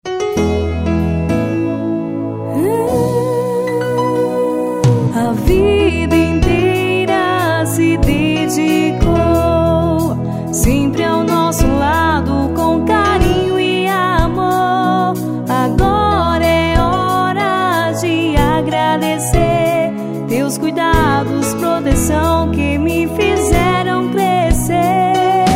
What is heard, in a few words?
JINGLE, Dia das Mães; SACOLÃO DA FÁBRICA